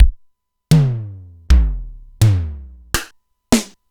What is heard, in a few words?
Simmons SDS-9